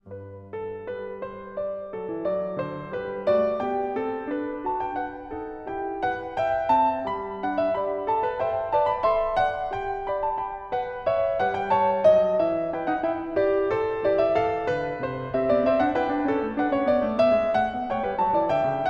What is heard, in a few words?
Canone alla Terza a 1 Clav; Bach Goldberg Variations BWV 988 (Live)